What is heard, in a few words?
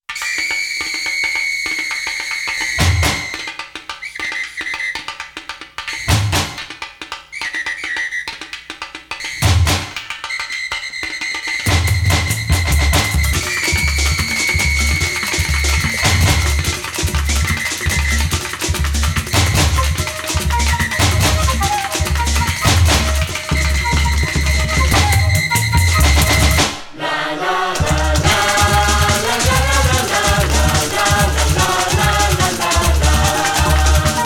Last Samba; Katamari